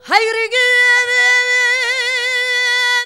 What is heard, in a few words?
SUNG ROMANI VOX; Best Service; World Colours